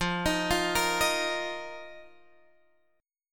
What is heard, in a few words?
F 7th Suspended 4th F down Guitar Open G